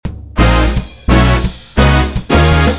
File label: Sus chords